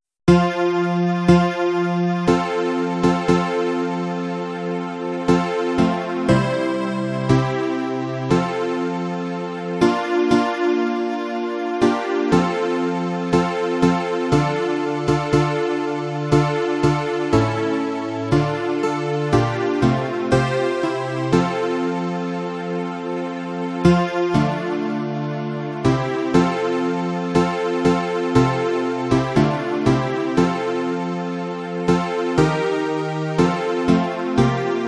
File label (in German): F-Dur Atmosphere